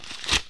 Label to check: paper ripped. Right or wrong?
Right